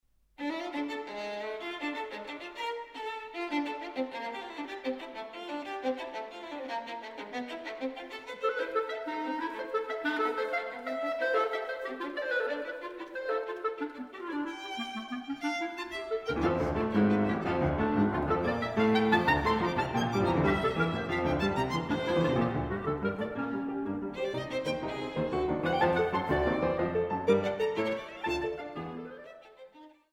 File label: Trio for clarinet, violin & piano; Mvmt III